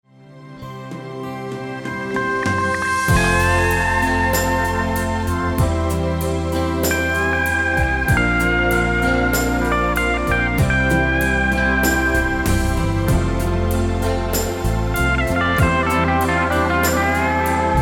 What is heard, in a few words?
Ld Gt Included Key Of F Major